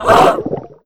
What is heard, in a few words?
Siren Typhoon Damage